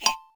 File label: mole Heh; HeavenStudio; crop Stomp